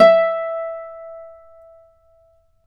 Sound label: Celtic Harp, Plucked Strings